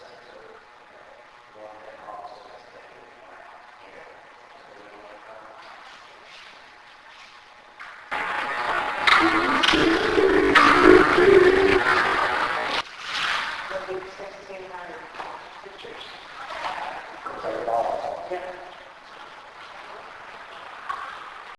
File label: unknown slowed down maybe a voice; Case 012 Pocahontas Fuel Building 1st Floor Lobby